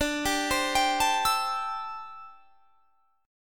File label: D 9th Suspended 4th D down; D9sus4; arpeggiated